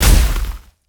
katana wave

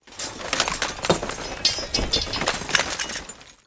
weapons drop

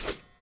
p atk spear

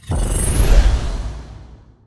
skill activate

